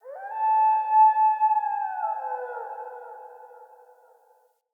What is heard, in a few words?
Wolf Cub Landscape Howl; Orengal